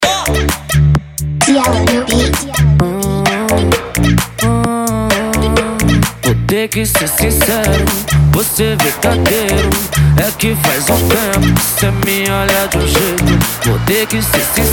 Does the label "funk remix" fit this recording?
Yes